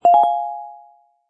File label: correct; Ball Physic Game